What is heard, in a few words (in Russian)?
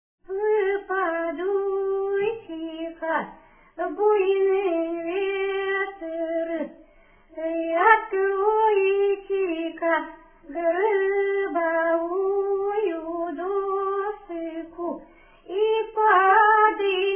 колыбельная, с.Чарусс касимовского р-на; Баю, баюшки, баю